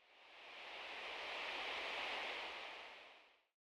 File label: Swipe